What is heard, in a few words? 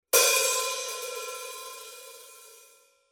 loud open; HHB